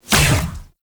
Quick Draw Shot; weapons; Warframe; The New War; Omicrus

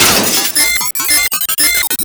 Small Dax Bomb Impact; Gameplay; Warframe; Tau

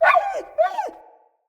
Wolf Pain Yelp; Animals

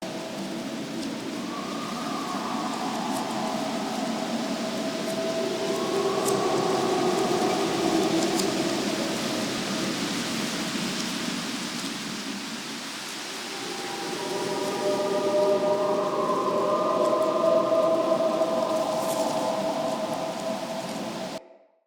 Day Amb Loop, Ambience, Glades; Glades Of Andurin

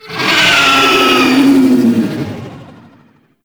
Use Ability; Enemies; Warframe; Tau; Sentient Dactolyst

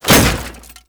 Restrained Foley, Worker; Warframe; Characters; Solaris

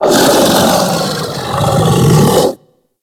Kubrow Tame Sleep Idle Yawn D; Warframe

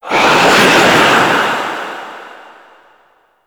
Sandstorm Gust Enemy Pickup; Warframe; Mummy

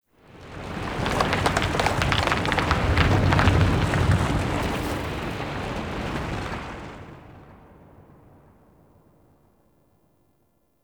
Earth Quake Rumble; Warframe; Tau

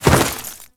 Step Ice, Footsteps; Warframe; Grineer

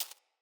Rat Step, Animals Ambient Foley

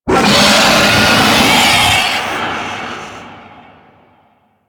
Vocal Screech; Warframe; The New War; Archon; Boreal

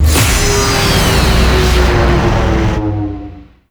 Prime Spawn; World Seeder Descent

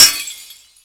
Glass Impact; Warframe

